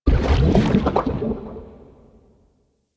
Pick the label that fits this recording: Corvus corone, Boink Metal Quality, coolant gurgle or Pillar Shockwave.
coolant gurgle